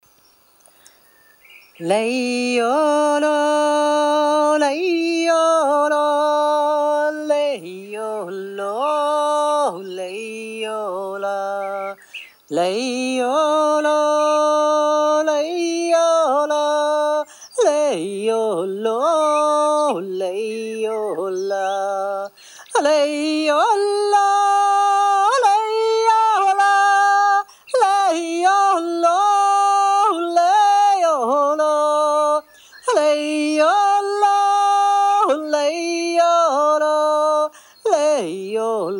yoik

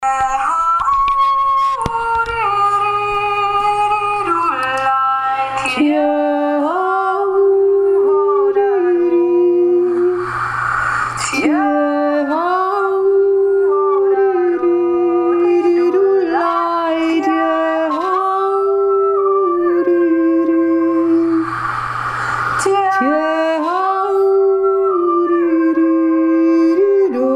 wolfsjodler alle drei stimmen

